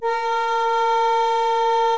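A sharp 4 with A sharp 5 with F6, multiphonics, flute; modern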